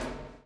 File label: CHAIN; Spectrasonics - Backbeat